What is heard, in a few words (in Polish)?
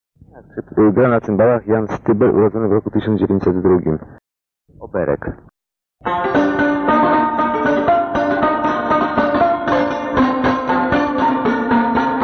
Oberek